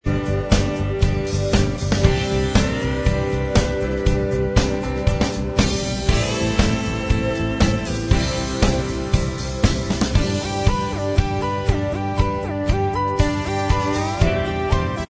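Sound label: Instrumental Track, backing tracks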